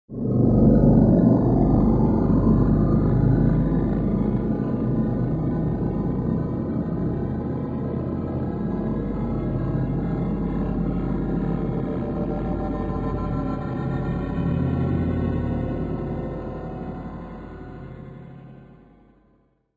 Ambient, thriller-horror ambience sound; High quality thriller-horror ambience sound perfect for building tension and drama in film or game scene

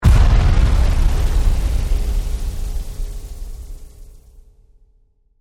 Hit Swirls; Sound Design; BlastWave

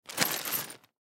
Coins Plastic Bag; Bag Pick Up Cement; foley; Money Sound Effects; Objects; Blastwave

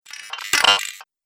Droid Digital Part, robot; Robot Sound Effects

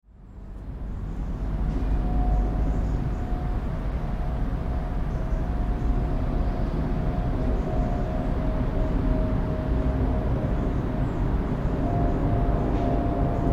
Jet Airbus Over Medium Shot, city ambient; Medium Close Shot; Tom Hutchings

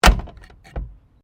Car Holden FE Door Close, Classic Australian cars; Vehicles

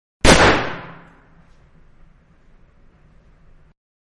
Guns 22 Caliber Rifle one shot; Rifles; Manhattan Production Music

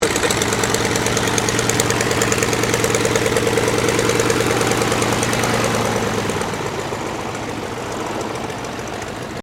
AIRCRAFT AVRO 643 MKIA CADET TAXI, Biplane; 1934; Airplanes; Vehicles

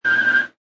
Car Or Motorcycle Brake, Tyre Screech; Tyre screech. Auto, car motorcycle or motorbike braking, accelerate or steering screeching sound.

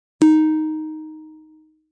Dong; Dongs; multimedia

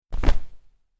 Cloth Character Movement Foley Whoosh

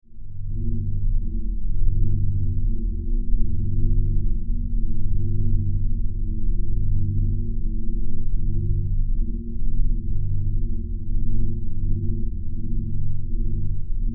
Science Fiction Alien Power Drone Modulation; Spacecrafts

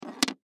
Glow Stick Set Down; SoundEffects-Blastwave

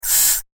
Short Sharp Shock Laser Zap; Laser Gun Sound Effects; science fiction sound effect

